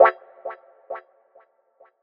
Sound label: Synth F Min; Unit Rcrds